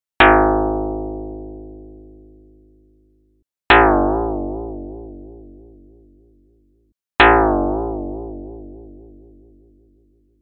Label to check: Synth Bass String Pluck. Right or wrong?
Right